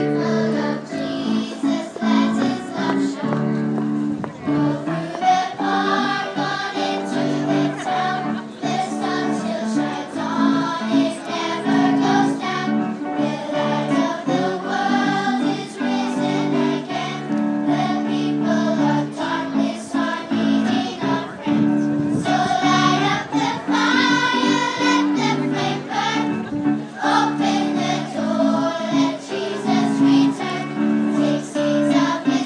primary school choir singing; omagh inter graded; as part of inter graded education week

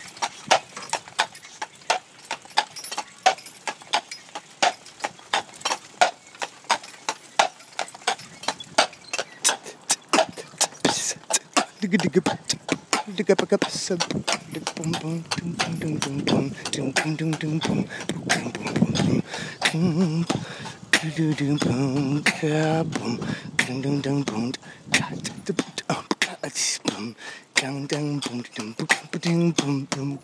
beatbox horse clops on a bike

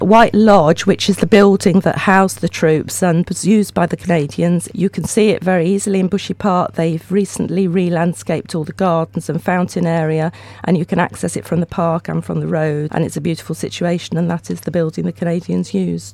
concert at parish church including singers; kingston; kingston ontario

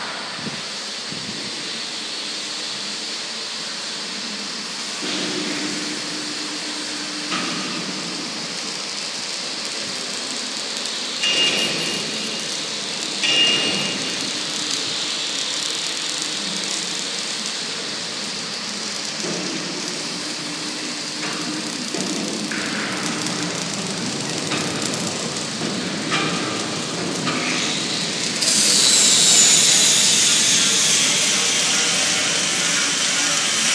soundscape boats; mooney

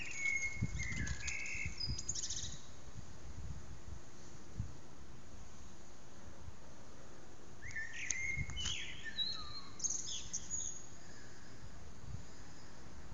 birdsong in Dalston

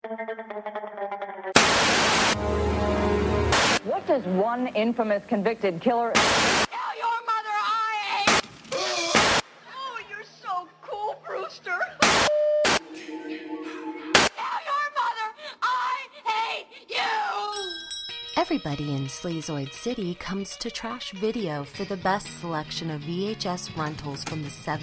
horror video store intro

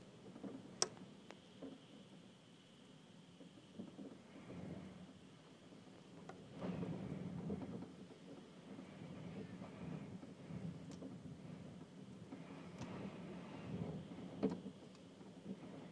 wind rattling slates double glazing

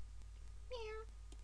meow

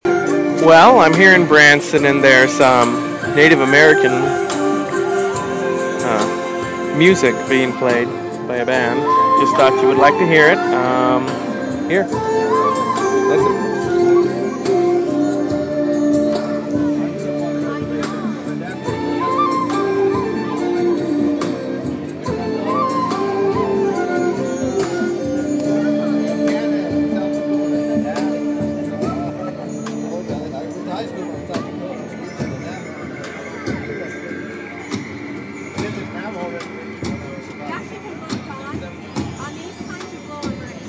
native american band; Ranson Landing

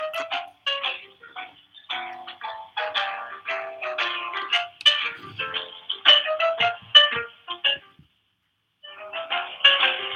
hold music

